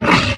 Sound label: vox attack